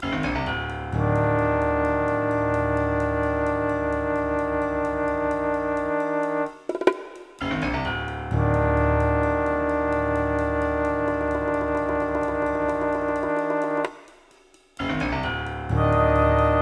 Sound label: Tension; The Shivah